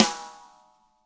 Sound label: rim; ILIO; Double Platinum Drums 1; DW SNR